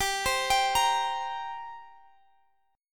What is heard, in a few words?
G Minor Double Flat 5th G, Guitar Standard; arpeggiated; x,x,17,17,20,18